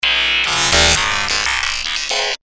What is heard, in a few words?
distorted electronic sound